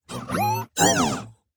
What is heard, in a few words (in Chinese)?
询问的哔哔声; 守望先锋; 堡垒